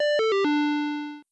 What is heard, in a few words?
Shutdown; Windows 2.5x; Windows Never Released Soundpack 1; deviantart